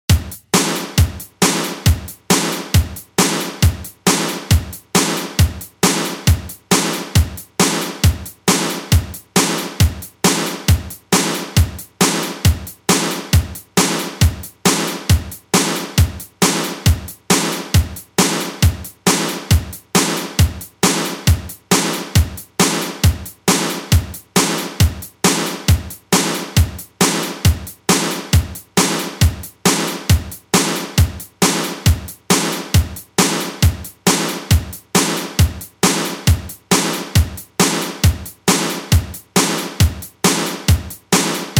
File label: cheep drum hageshii; チープドラム激しい; イメージ：ずっと同じ調子 カテゴリ：ユニーク・怪しい